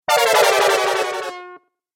Videgame Failure Fx; Video Game Sound Effects

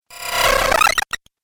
Game Glitch Effect, Video Game Sound Effects

